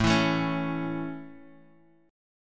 A Major, Guitar, Drop D

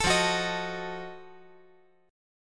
F 7th Flat 9th F down

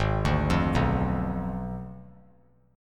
G sharp Diminished 7th; arpeggiated; 3C,3F,3A,3D; 8,9,8,9

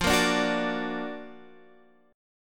F sharp Augmented Major 7th, F

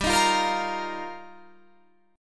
G Minor 7th Sharp 5th G, Guitar Half Step Down

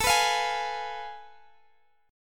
F Diminished A; Fdim/A strummed; JGuitar

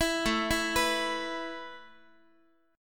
A Suspended 2nd A Guitar, chord; Asus2; arpeggiated; x,x,7,7,8,10; 4A,4E,5A,5D,5G#,6C#; JGuitar

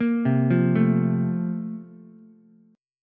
A sharp Major 7th Suspended 2nd, Ukulele; A#M7sus2; arpeggiated; JGuitar

